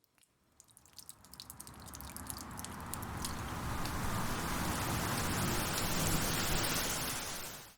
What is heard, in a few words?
rain start